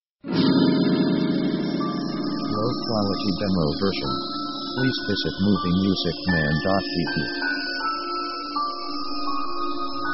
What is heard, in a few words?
orc thriller score; Action themes